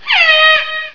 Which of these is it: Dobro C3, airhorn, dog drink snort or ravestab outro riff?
airhorn